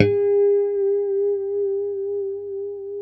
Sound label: A4 pick harmonic, Guitars; Spectrasonics; Hans Zimmer Guitars VOL-1; JC-HARMONICS